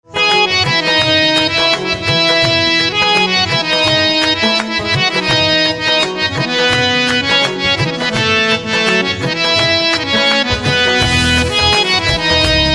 Harmonium

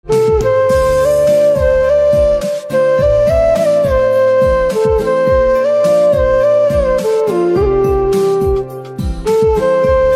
Flute

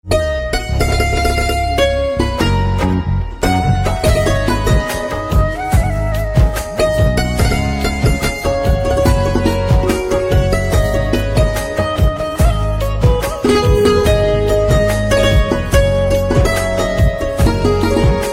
Santoor

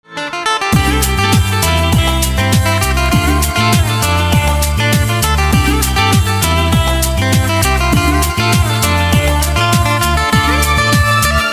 Guitar

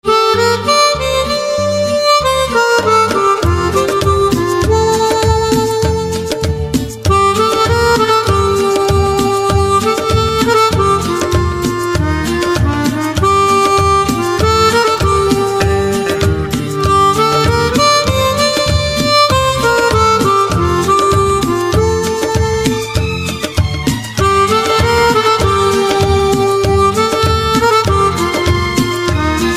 Harmonica

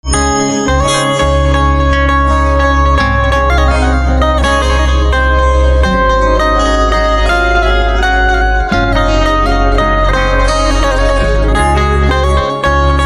Banjo

